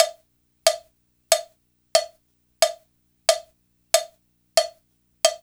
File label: COWBELL; East West; Funky Ass Loops